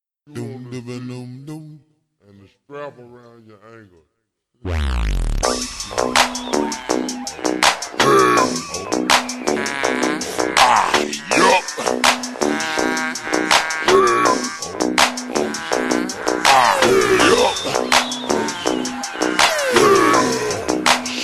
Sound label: 31Hz; Gromkij front Avtozvuk